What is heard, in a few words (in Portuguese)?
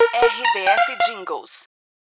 sinal de hora; LIDER